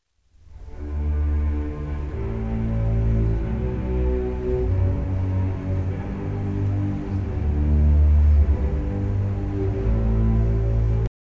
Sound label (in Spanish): ostinato; bajo ostinato